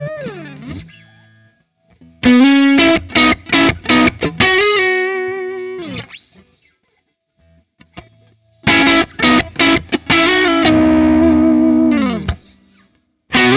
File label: Elec Gtr